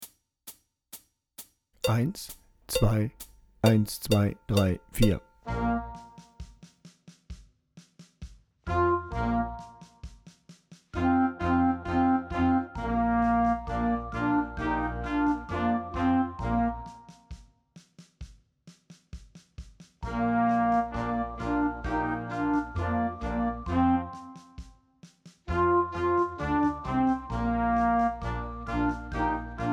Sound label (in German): Rock Klarinette lauter